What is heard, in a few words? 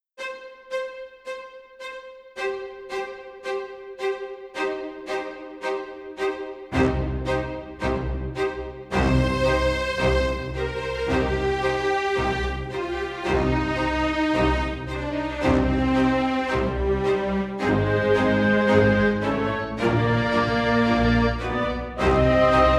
March In C Minor